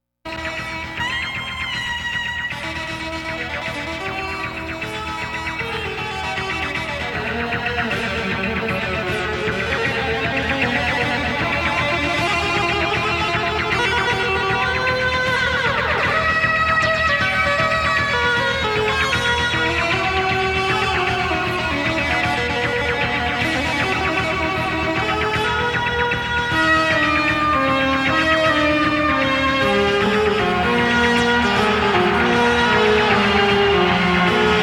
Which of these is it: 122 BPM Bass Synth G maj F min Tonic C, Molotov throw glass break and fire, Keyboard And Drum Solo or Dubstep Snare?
Keyboard And Drum Solo